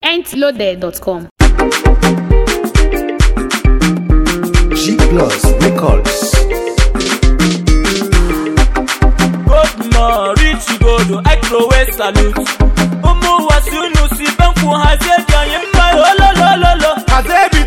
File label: JINGLE